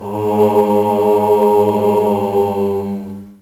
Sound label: Sinister Chord